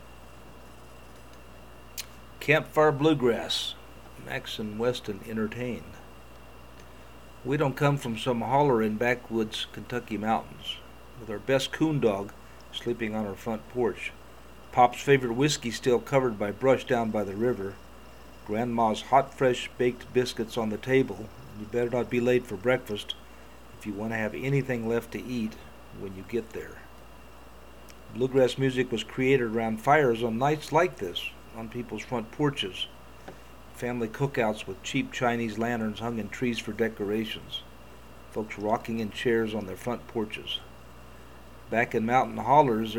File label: campfire bluegrass